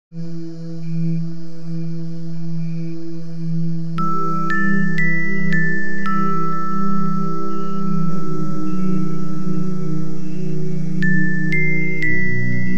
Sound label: lullaby